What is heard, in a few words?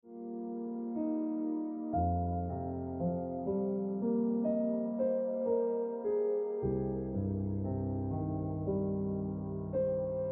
Ringtone Piano Music